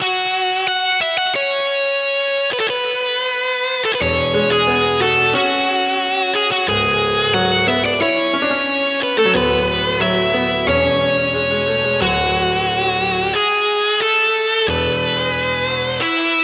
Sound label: guitar; ultimate guitar kit my beloved